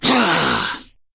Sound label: Attack; Ionar